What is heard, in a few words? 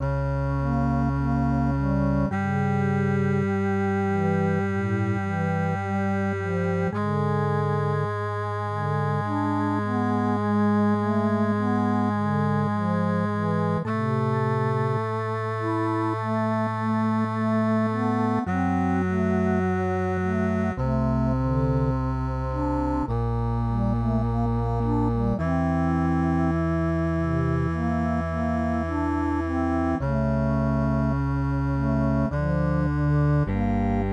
MIDI